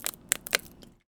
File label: Foley